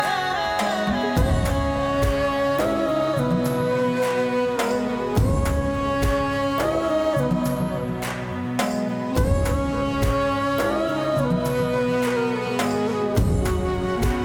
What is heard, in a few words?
folk Music, telugufolk